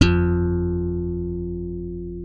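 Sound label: left thumb F2, slap thumb; Xsample VOL-1; 90 s Sample CDs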